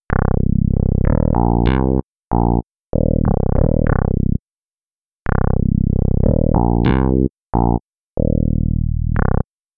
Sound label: Moog V-Bass